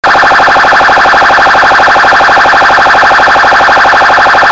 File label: HI YELP, sirene; sirene orbe